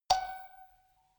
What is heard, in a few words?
notification kalimba rattle high